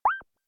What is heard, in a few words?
notification